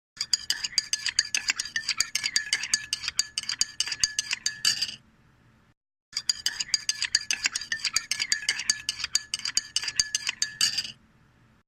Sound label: STIRRING ON A CUP MUG; Glass cup and Saucer; صدای استکان، فنجان و نعلبکی